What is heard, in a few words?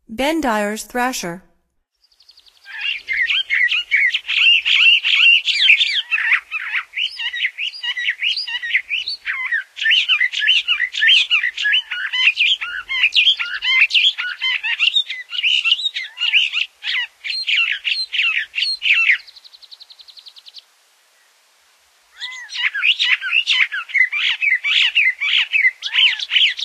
Bendires Thrasher, Songs of Birds of North America; Stokes